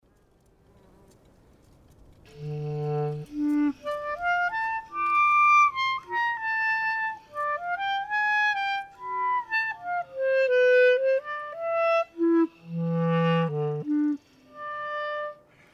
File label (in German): Melodic Tonal; Minos Sud; Minos Stück